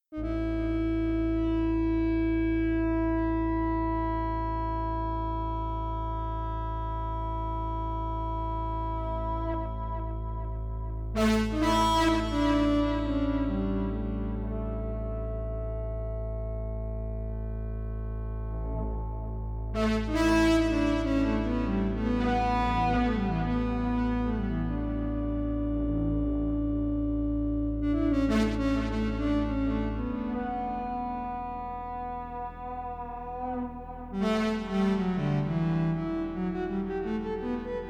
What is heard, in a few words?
Sequential Prophet T8 Solo